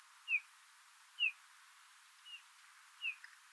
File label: Pandion haliaetus; ecologia paisaje sonoro